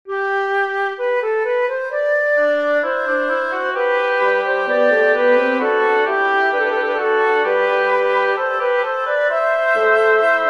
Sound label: woodwind trio